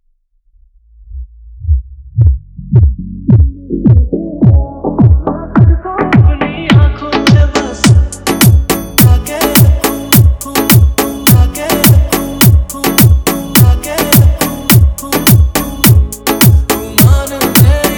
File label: Electro Drop Dance Mix; BollyWood; Dj KamalRaj Ayodhya